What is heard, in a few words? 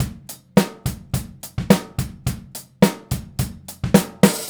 Straight Kit, World Pop Beats